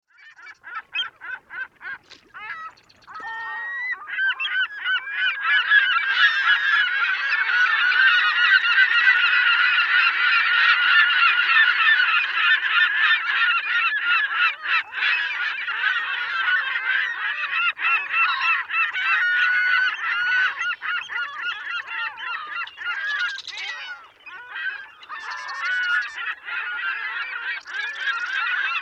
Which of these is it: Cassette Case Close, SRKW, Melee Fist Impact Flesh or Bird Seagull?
Bird Seagull